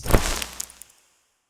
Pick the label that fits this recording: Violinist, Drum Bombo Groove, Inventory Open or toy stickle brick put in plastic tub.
Inventory Open